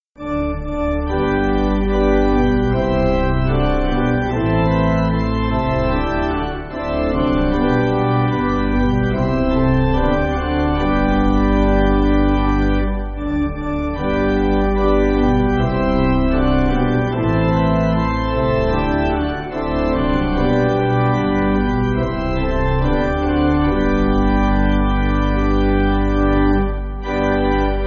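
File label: Pipe Organ, Music Backing; I Love To Sing; Me Agrada Cantar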